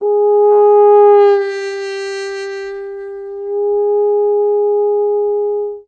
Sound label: horn fx; Musical Instruments